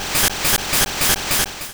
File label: Confuse Ray Start, Ghost Attacks; P3D-Legacy